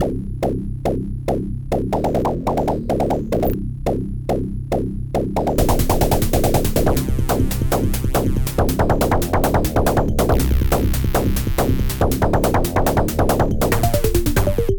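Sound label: elec rock, adlib; VGA Spectrum 2; dblast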